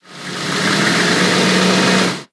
Vehicle Engine; blue archive

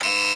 Denied; blue archive

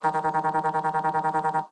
Vibration; blue archive

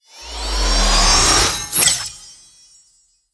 Teleport; blue archive

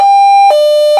hilo, sirens; galls street thunder 800

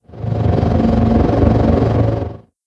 Drone; blue archive

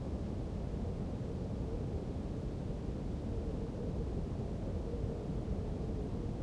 Snow; blue archive

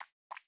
Drink; blue archive

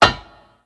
Ladder; blue archive

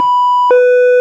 hilo, sirens; code3 sprinter

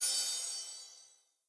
Complete Effect; Conquest; blue archive

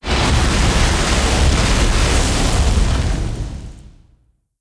Laser; Binah; blue archive

